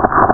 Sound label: SLICER